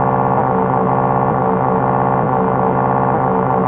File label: hard dark electronics loop; LOOPING AMBIENCE